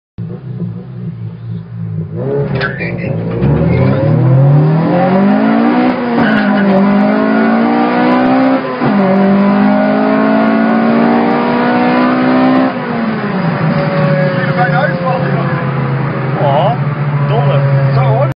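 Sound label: Daihatsu, car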